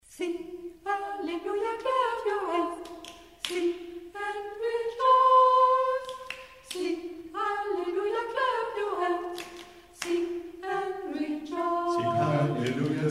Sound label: Sing alleluia clap your hands